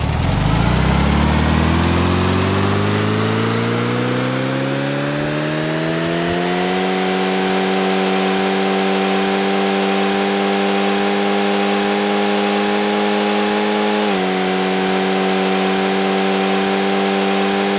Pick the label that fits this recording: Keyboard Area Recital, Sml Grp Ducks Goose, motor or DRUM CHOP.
motor